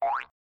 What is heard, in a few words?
jump